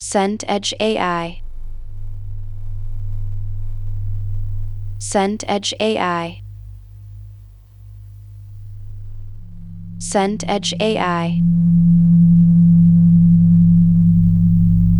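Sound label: ambience subway platform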